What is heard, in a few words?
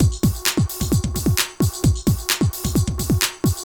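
drum loops, right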